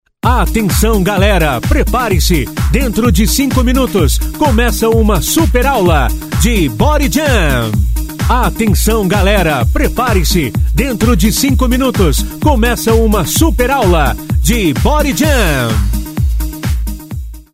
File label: body jam